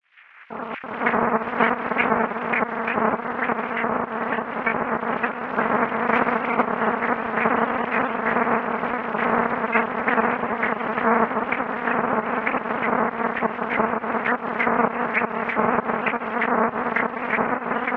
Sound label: digital pulse 7 mhz; hprModel sines hpsModelTransformation